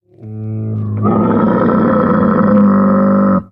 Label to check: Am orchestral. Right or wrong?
Wrong